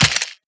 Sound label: weapon c4 impact; physics; weapons